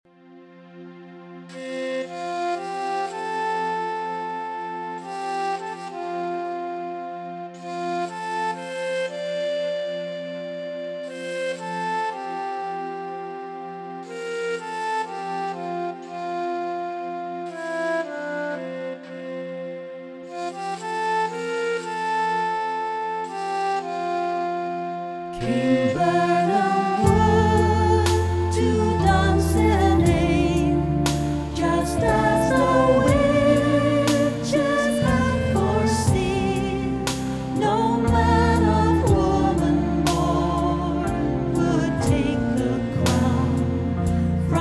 finale vocal; macbeth; On the battlefield Macbeth seems invincible. Then he is confronted by Macduff

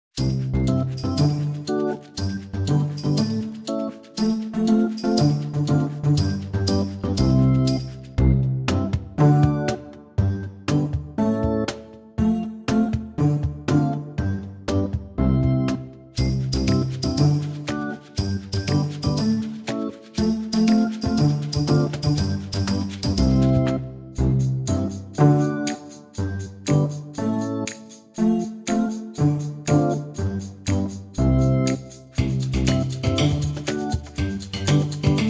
pizzicato plonk; join in with music; A fun song for beginner cellists to join in with the tune and everyone else to contribute

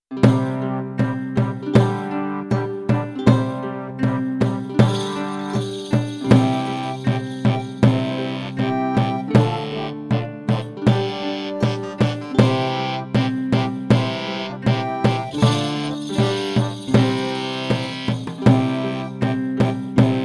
pavans and pageantry backing; tudors; Pavans and pageantry - download backing track

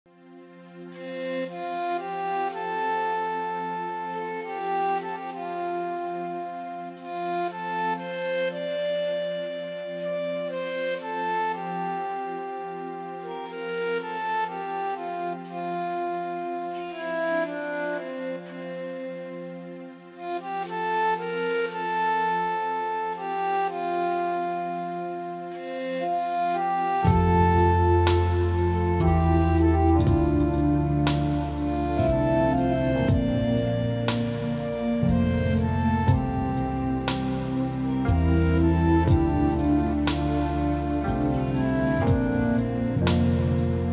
finale backing; macbeth

